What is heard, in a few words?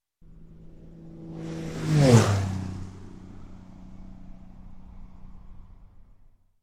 Piper Twin Prop Low Fly Past; Sound Ideas; Series 2000